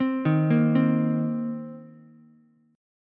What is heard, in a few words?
C Minor 7th C Ukulele; Cm7 arpeggiated; JGuitar